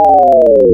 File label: Bell Drop Upper, wavetables; Animoog